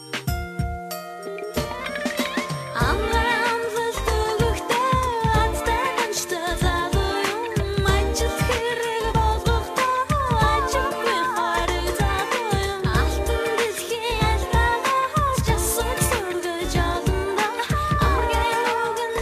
love song

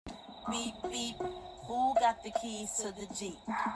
Beep; jeep

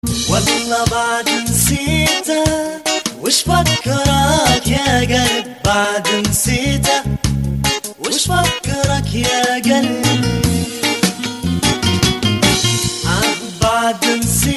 libye music

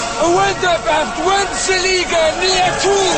Spell; Merlin

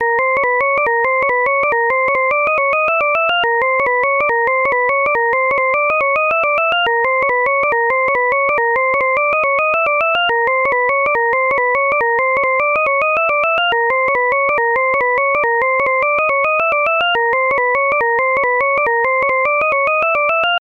Scan

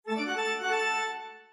organ; 365scores

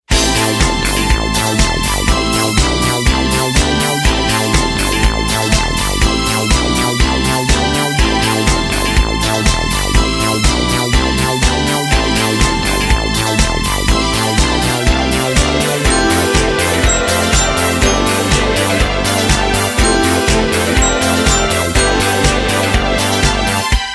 disco

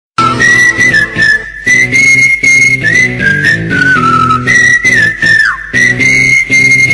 Whistle

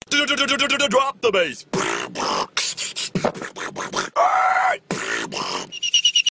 bass

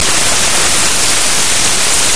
Ya Taiba Arabic Vers

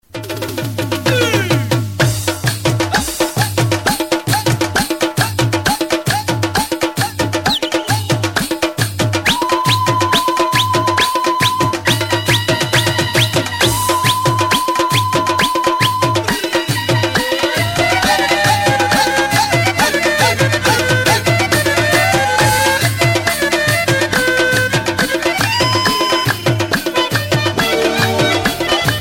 Pashto Music

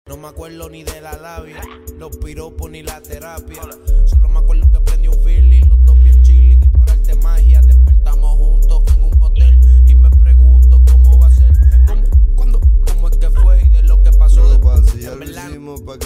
Bass

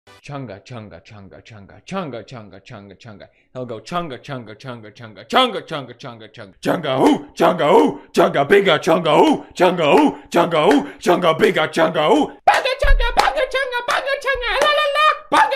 chant; Big chungas

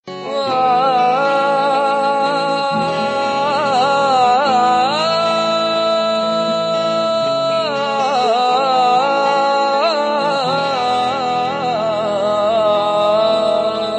Alaap

